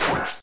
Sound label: Warp; Touhou Jitsuzaisou Dream Logical World